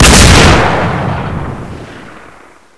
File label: fire, pistol; weapons